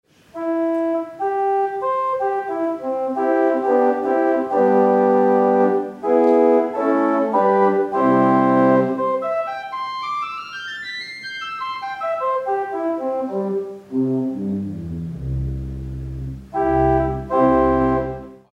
Prinzipal, rundfunkorgel

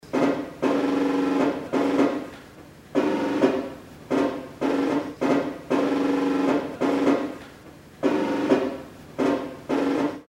Kleine Trommel

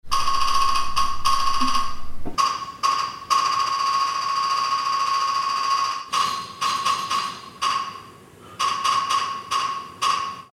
Holzblock

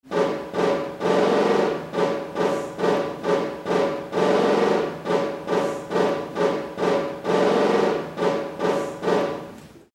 Kleine Trommel

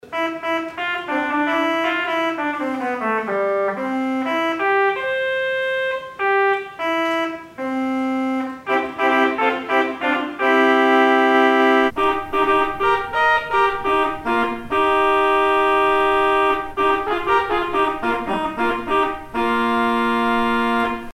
Trompêtte à piston, Rundfunkorgel

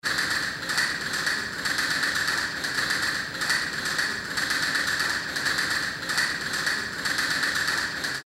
Castagnetten, Rundfunkorgel

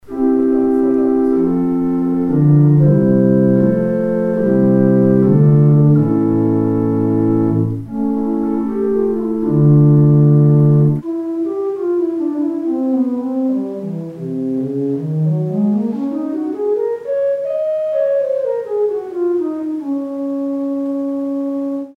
Bordun Horn, rundfunkorgel

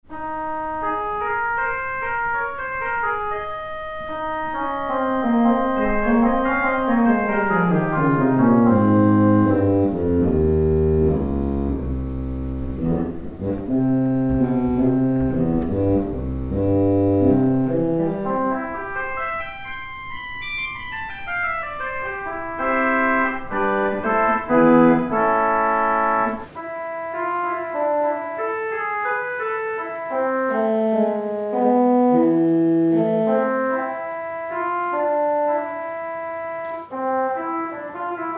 Oboe, rundfunkorgel

